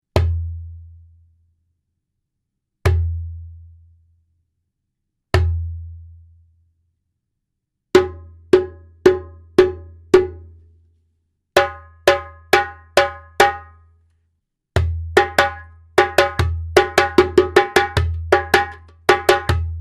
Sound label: djembe